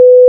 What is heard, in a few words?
basic Sin